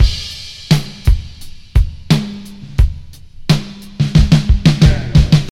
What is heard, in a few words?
117 bpm drum loop g sharp key, Free drum loop - kick tuned to the G# note. Loudest frequency: 739Hz; drum loops